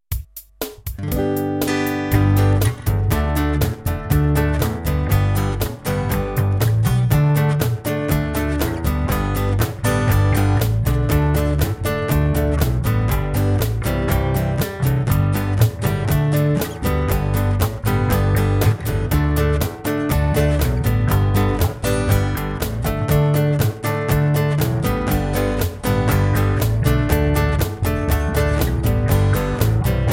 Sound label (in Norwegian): Det sat to kattar på eit bord instrumental